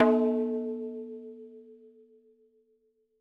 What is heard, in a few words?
madalam notes L